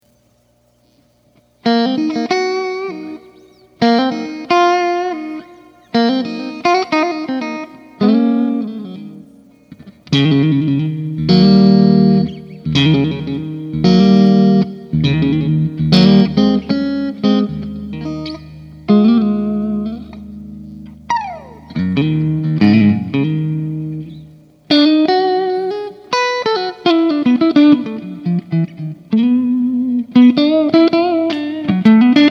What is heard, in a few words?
strat clean ish marshall creamback